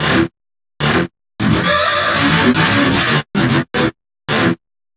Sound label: GTR, Chemical Beats